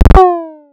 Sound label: Star Instruments Synare PS-1